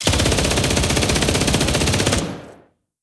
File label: MG Shot; blue archive